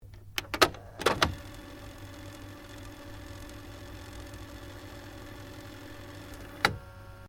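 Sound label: Stereo Akai Mini Tape Deck Fast Forward Stop, Multi function music player; Tape Players; Electronics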